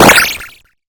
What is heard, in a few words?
game retro 8bit kill explode jump on